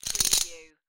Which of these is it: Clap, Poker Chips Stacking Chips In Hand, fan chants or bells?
Poker Chips Stacking Chips In Hand